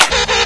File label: slap, penguin